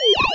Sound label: siren